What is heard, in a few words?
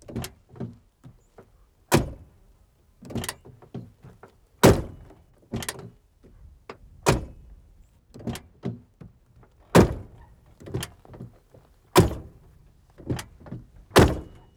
Vehicle Door Town Car Ext; Vehicles; Doors & Keys